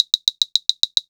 Rosewood Claves

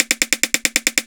Rim Shot

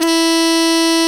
Harmon Mute G#2

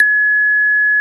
Kalimba C5

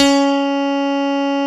CP70 D#2

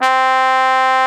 Trombone E2

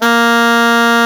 Hard Alto Sax D2

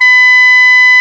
Oboe E4

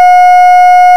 Sine tick

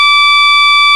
Reed Wave Gb4

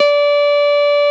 Jazz Guitar Gb3

